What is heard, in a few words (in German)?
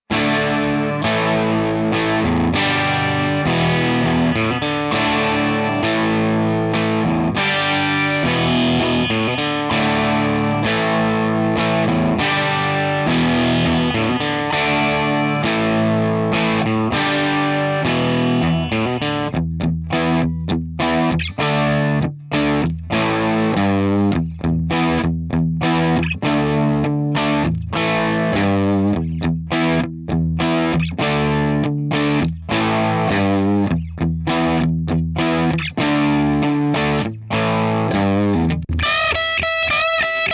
Aufnahmevergleich Interfaces GarageBand nur LineIn